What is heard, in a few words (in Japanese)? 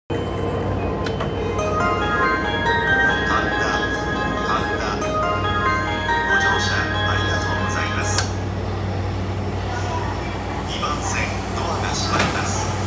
JR 山手線 神田 CW, train sounds